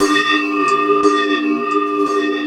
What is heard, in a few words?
SOFT CYMBAL; RHYTHMLOOPS; Rarefaction; Poke in The Ear With A Sharp Stick